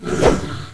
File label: chaos attack